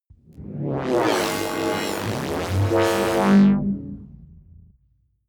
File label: fluctuating synth; comedy-cartoon; elektronisch